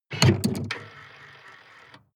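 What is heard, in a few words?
sports car exterior trunk unlock; verkehr transport; autos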